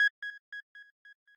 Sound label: arp